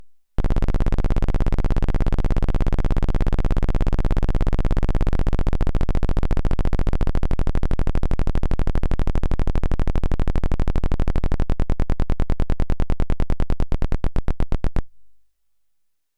Toy Synth Distorted Bass Deep Aggressive Low Clicking, Circuit Bent Toys; Major Morgan; Submerged Tapes; Circuit Bent Toys Volume 1